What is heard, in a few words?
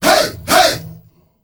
"hey", world voices, afro; ILIO; Vocal Planet VOL-5; AFRO NOISE